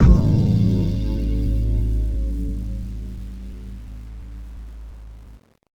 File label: Retry Jingle; Spirit; Friday Night Funkin' Mind Games OST PC mod gamerip